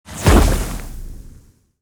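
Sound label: symbol Explosion; netent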